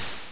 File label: FM Ride; Genesis